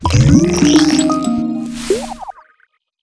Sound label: Checkpoint